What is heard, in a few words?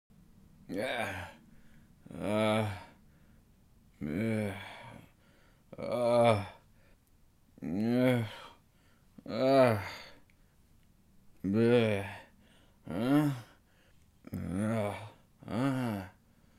Groggy Hungover Waking Sounds Male